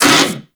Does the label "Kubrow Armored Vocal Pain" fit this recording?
Yes